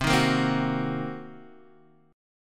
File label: C Minor 7th Flat 5th Guitar Half Step Down, chord; Cm7b5